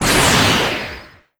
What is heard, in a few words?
Enemy Active Skill Aura